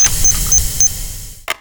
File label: rad vent